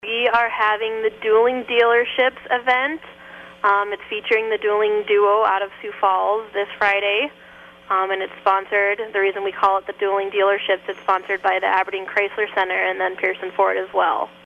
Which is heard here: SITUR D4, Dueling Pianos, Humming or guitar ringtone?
Dueling Pianos